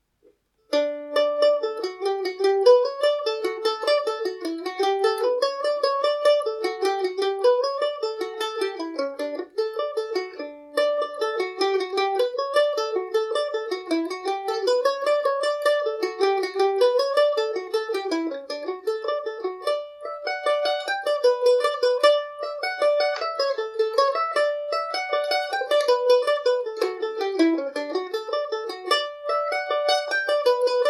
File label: dmaj, mandolin; Benedict’s Rambles; Kentucky KM-1000 mandolin in July 2021